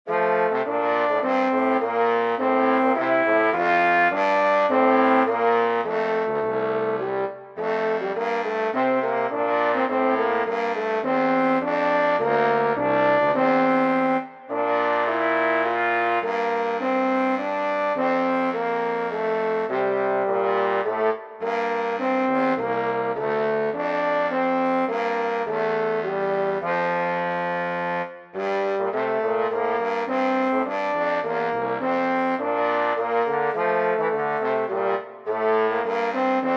Brass for 2 3

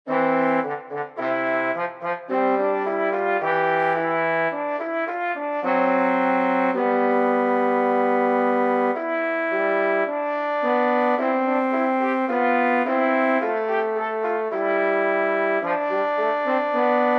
Brass for 2 trombone and bas trombone

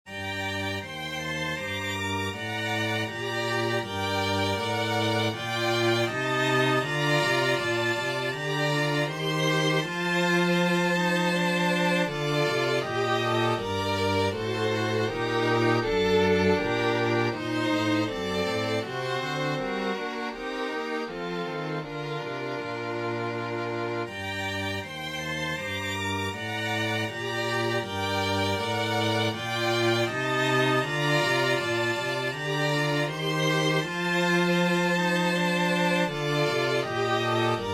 Melody in G minor strings

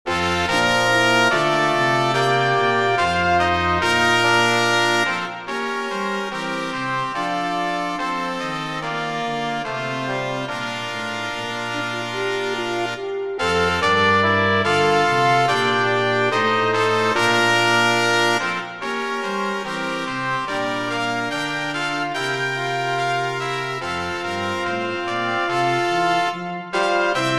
brass

